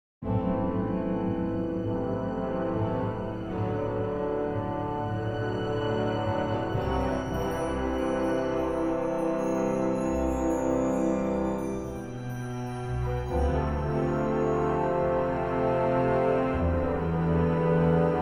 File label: French horn pulse; Space; Nomads (2016); MUSIC FOR DRAMATIC PURPOSES